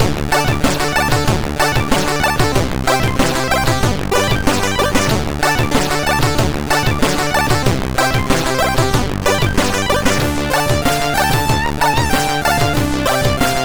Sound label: Intro, MOS6581R4; Jinxs Intro 1